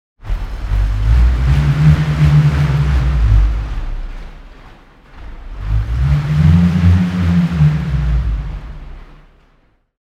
wind; spiritcatcher

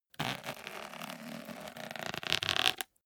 zipper small case close slow

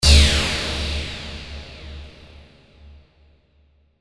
lazer

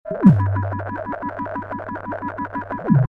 neuronal staccato signal

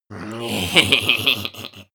goblin laugh evil

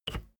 bicycle street brake press

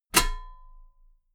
metal ladder step heavy

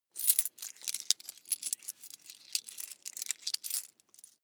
coins clatter soft long

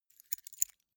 key ring small grab soft

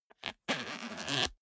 zipper small case open

